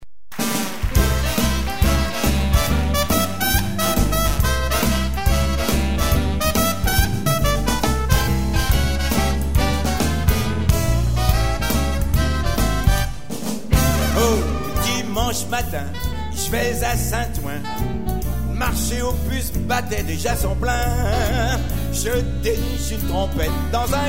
trompette

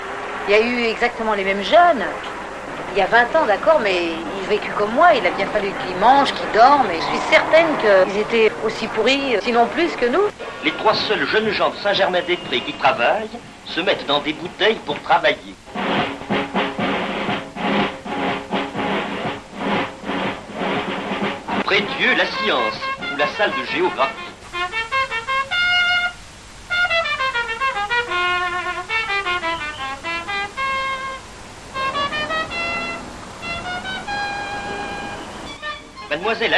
solo trompette; COURT METRAGE LE DESORDRE A VINGT ANS (20 ANS DE DESORDRE A ST-GERMAIN-DES-PRES); Film de Jacques BARATIER; ARGOS FILMS

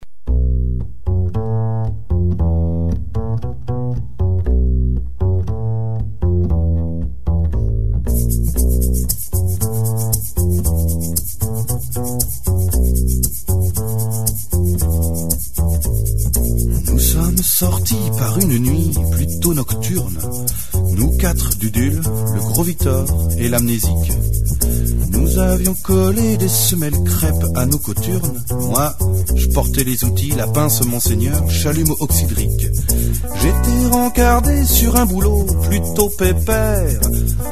tango interminable

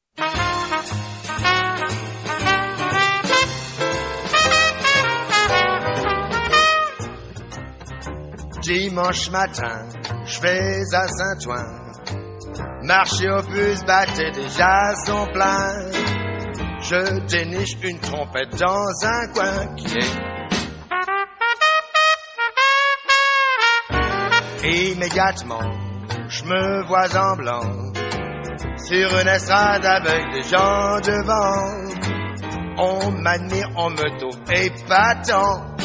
trompette d'occasion

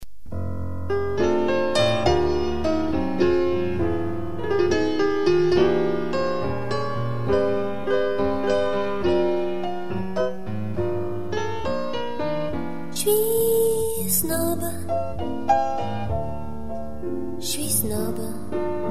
blues

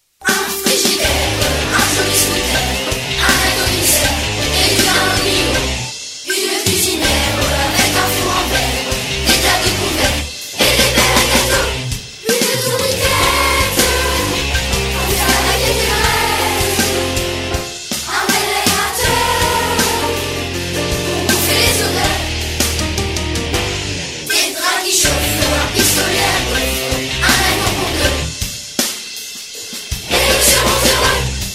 deux cents voix

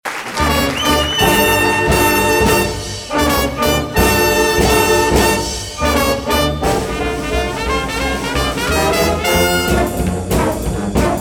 fanfare

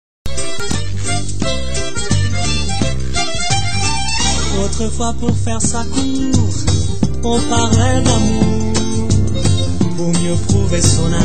orchestra

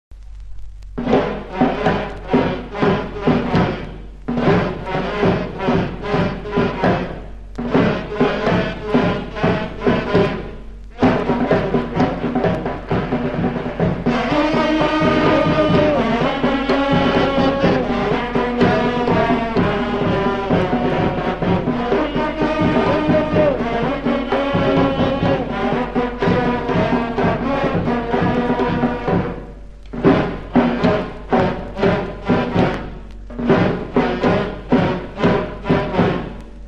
bigophones

